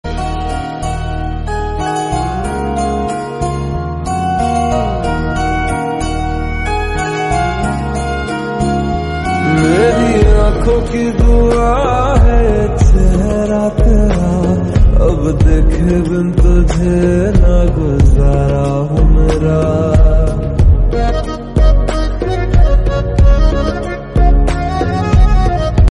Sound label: Romantic Hindi